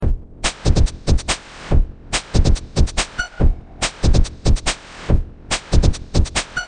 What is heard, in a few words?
Electro Simple